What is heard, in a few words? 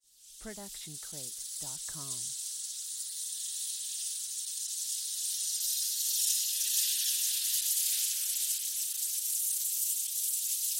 Magic Portal; SoundsCrate